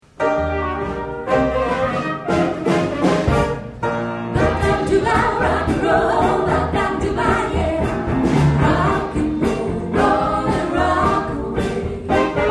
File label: Rock'n'Roll